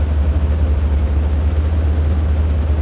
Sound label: air conditioner, ambience; Soldier Of Fortune II Double Helix; XBOX